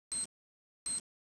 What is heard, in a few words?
c4 beep